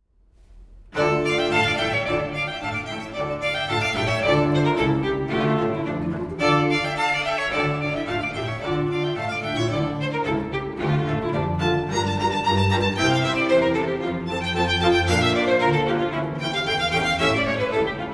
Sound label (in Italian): concerto allegro, organ, solo violin; organo obbligato; Concerto RV 541 - Allegro; Vivaldi; Concerti con organo obbligato